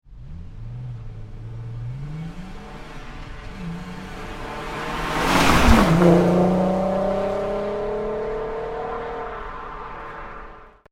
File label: Golf R32 passage, engine sounds; volkswagen